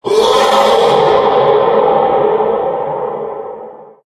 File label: male scream, halloween; Source Engine; tf